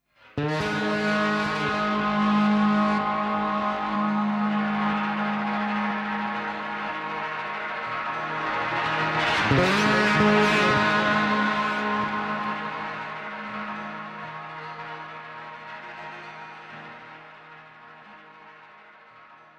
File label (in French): guitare dans la nuit